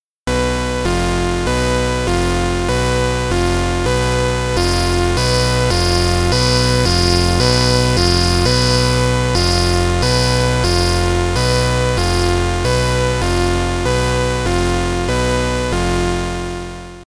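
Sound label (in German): iMono GTD Oct bass Lautstärke Verzerrung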